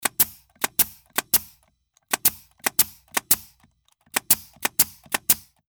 pneumatic nail gun

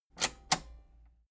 metal button robust spring click enable slow

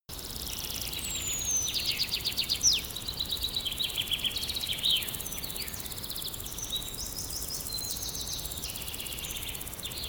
countryside

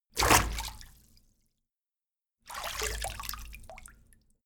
walk foot in out

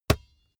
basketball single hand catch

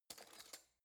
cutlery spoon drawer grab

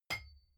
hammer rock hit

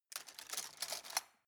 cutlery plastic drawer rattle

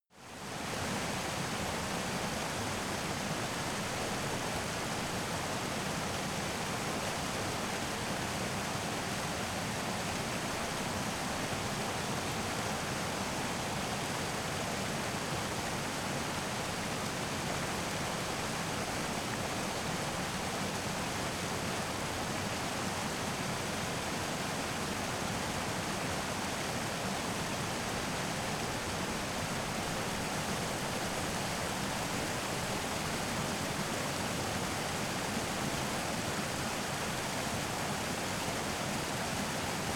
stream rush close

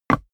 step walk wood solid

